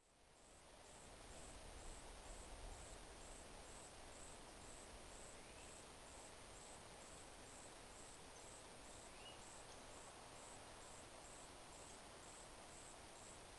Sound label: nature forest whispering pines; flatwoods; pinecastle; Sonniss GDC